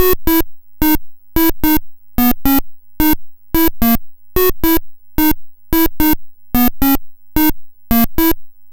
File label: Apple II Synth, Chiptune; Sonic Foundry (Sony Creative Software)